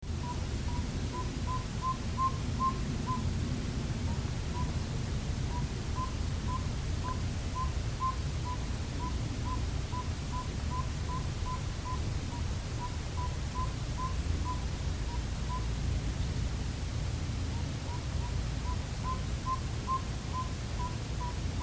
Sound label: northern saw-whet owl